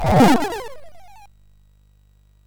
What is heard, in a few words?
SFX, MOS6581; Narco Police